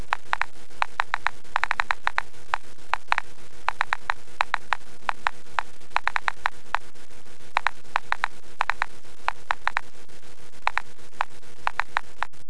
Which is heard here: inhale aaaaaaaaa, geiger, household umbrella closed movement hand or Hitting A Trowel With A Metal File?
geiger